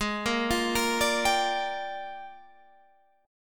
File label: Bb 13th Ab